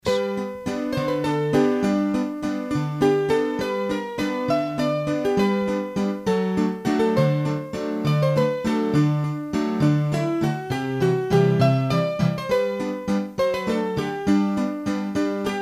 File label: Valse, hurdy-gurdy